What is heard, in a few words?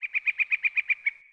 Bird